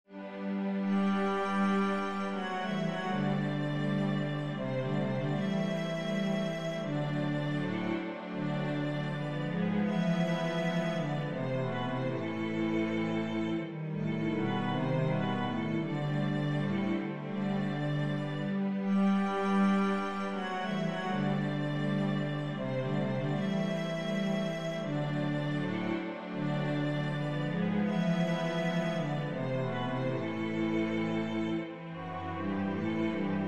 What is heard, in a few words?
nocturne, cello